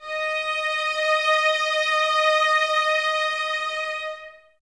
violas D#5, String Ensembles, Exprsive; Miroslav Vitous

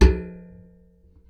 Tin Bucket, contact mic, Metalics

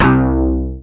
BAS FM DX-7

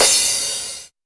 TR909 crash, Roland SH-32; Drumset 03

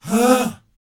BOYZ A, Vocal Planet R&B & Dance; ILIO

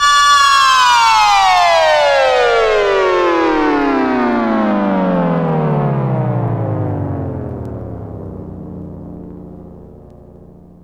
FX; AMG Megabass REMIX! VOL-2

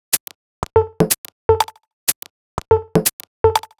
Sparse Top D 123bpm, House Drumloops; Zero-G House Fabrik Drumloops

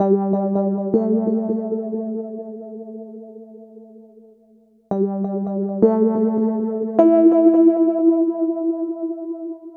EP, Keyboards, Electric Pianos

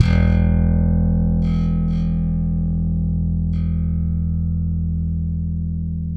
SLAP E 2, BASS; Spectrasonics; Bass Legends CD 1

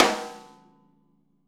snare rim; Sonic Reality; ID SNARE 3+

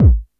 BD, Dance Mega Drums, BD HOU; Best Service

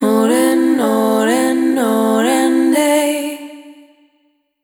Multi-Voice Loops, Vocal Samples; A Scarlet Glow; Sonic Foundry (Sony Creative Software) - Pocket Diva World Class Vocal Samples

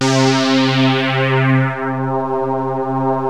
HEAVY ANALOG, MEMORYMOOG; OLD SYNTHS; Rubber Chicken Software Sample Bank

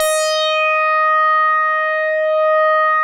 MINIMOOG

